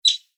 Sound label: marmot; ringtone